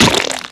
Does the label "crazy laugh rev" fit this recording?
No